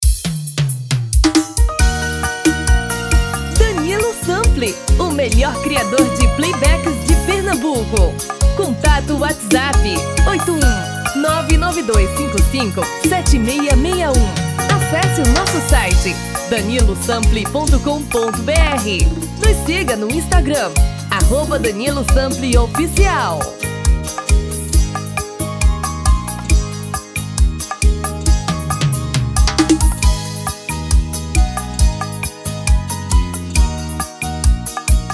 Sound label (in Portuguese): tom feminino